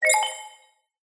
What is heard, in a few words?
science fiction computer tone interface positive successful beep complex